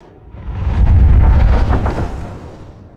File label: deep rumbling wooden groan and creak